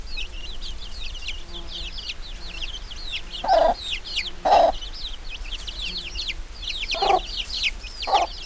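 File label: Gallus domestica juvenile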